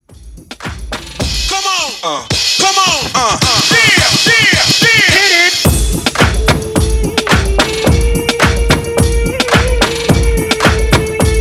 Pop RB 2000s Throwback 108 BPM

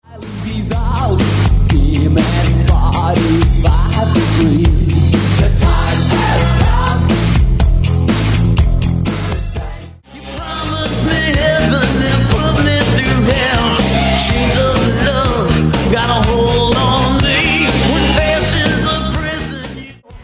80s 90s Rock Megamix

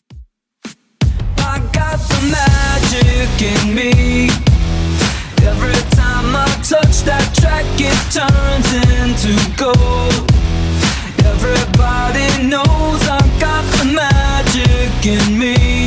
2ks Redrum 165 bpm

